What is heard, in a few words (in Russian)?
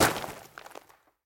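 негромкий шуршащий удар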